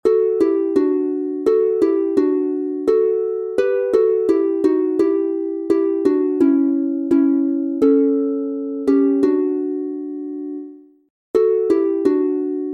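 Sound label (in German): D Dur 2 stimmig instrumental, Harfe; Schlaflieder; Gut Nacht mein feines Lieb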